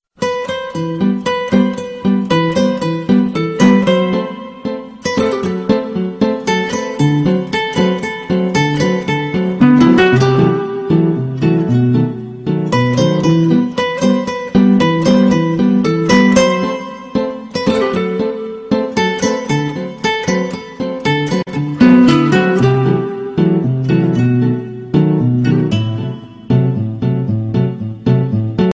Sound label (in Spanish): nueva guitarra española, tono de llamada